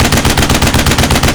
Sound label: 50cal loop; WAR